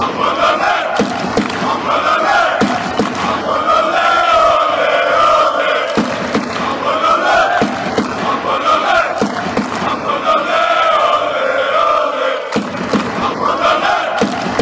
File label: ole ole apollon